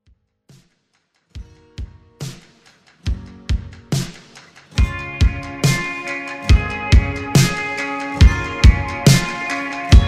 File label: Mixshow